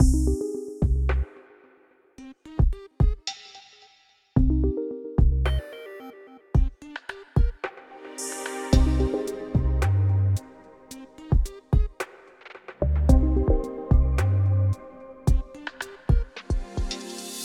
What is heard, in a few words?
Mood Mysterious Light